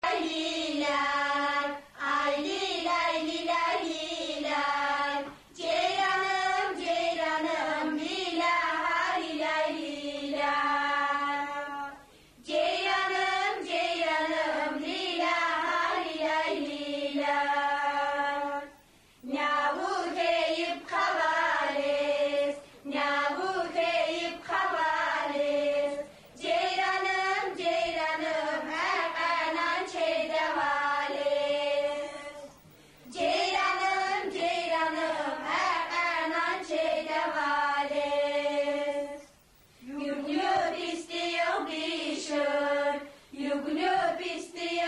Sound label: jeiranim hor; sahur